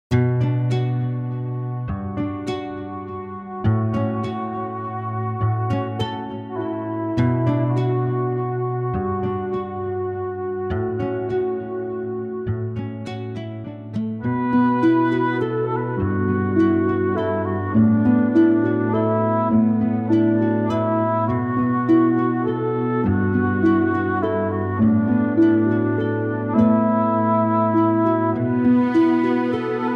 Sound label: Menu BGM; Alchemage